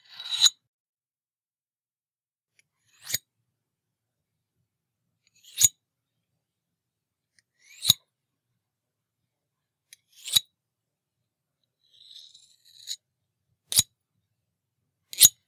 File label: Knife Slashes